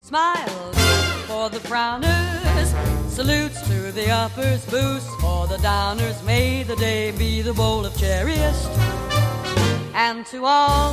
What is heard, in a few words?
A Very Jazzy Holiday